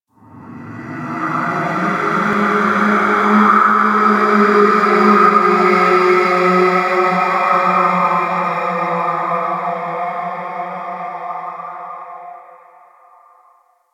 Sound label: Ice Cave Wind; Ambience; Warframe; Corpus Ice Planet